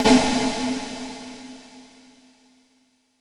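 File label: PCM60 snare drum plate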